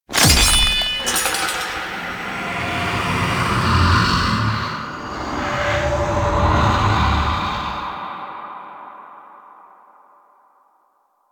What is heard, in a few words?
Sword Break; Cinematics; Stalker; Warframe; Orbiter Cradle